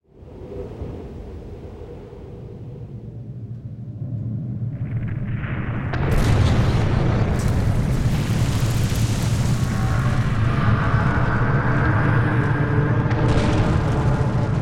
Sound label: Appear